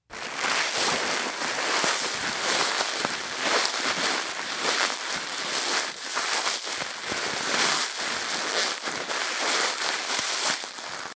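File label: bags sound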